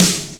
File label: sizzly acoustic snare sample f key; snare drum samples